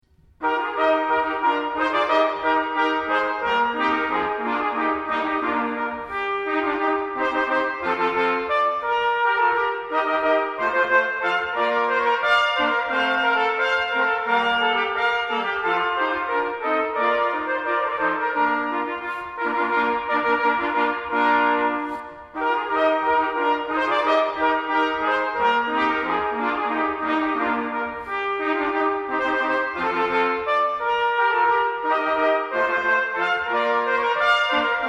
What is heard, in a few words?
Heroic March, trumpet; Telemann – Five Heroic Marches for Trumpet quartet (arr. Jay Lichtmann); No 1 – La Rejouissance